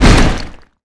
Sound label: impact